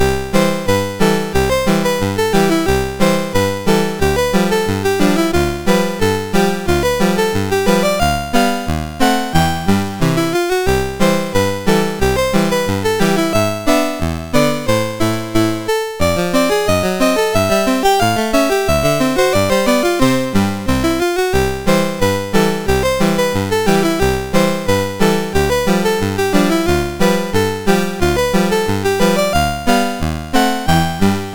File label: Rag, MOS6581R3; Roger Ambill